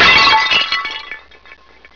Ceramic Pot Breaks; ClipMedia™ Demo

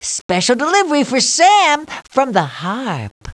Harp; Princess and the Pea; SoftKey

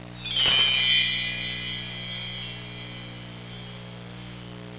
chimes; Sound Effects for Multimedia - Volume 1; electronic sounds

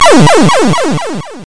DEATH; QUAKE2; megaman

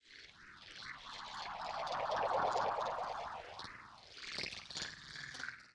ethno 125

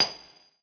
stonecutter; Zeus

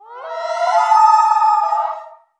bird loon

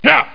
"YA"; The Ultimate Guide to Mortal Kombat

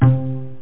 pluck, net instrument; Internet 1996 World Exposition; BrainOpera; net-music

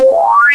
Gained Water; Koji the Frog 2.0.1

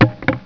TIRE

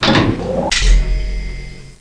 powerup

